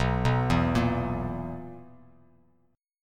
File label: B Major 7th Suspended 4th B down Bass Standard; BM7sus4; arpeggiated